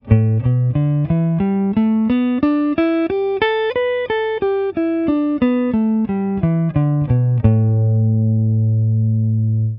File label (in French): Penta no 3 en mi, Guitare, Pentatoniques; Penta No 3 en mineur; 3ème position